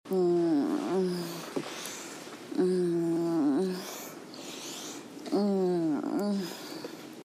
suck, moans; The Witcher Hunt

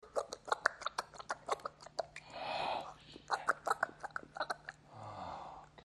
suck, moans; The Witcher Hunt

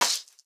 whack; Sex Traveler Comic Convention

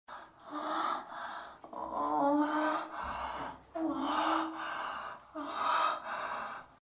moans; The Witcher Hunt

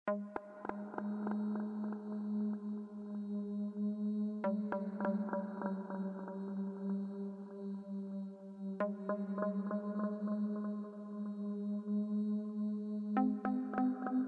fear; The Witcher Hunt

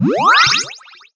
Absorb; Treasure of Nadia

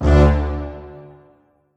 Error; Treasure of Nadia

